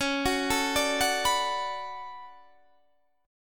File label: D Major 13th Db, chord